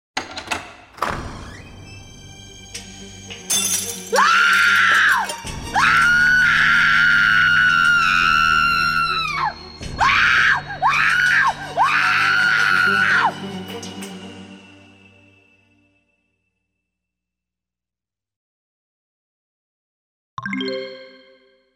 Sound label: Maid Screams; Mystara; TSR 2513 Mark of Amber Audio